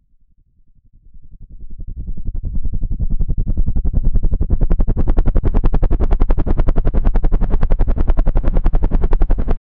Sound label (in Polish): wygeneruj przelot helikop, aerial